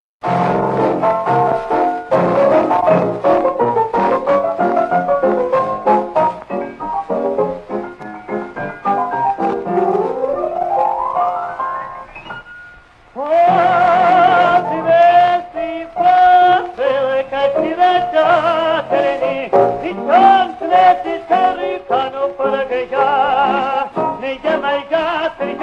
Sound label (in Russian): Цыганская таборная песня